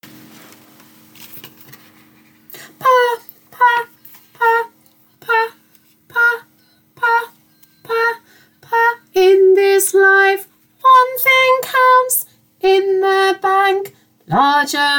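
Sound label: soprano part intro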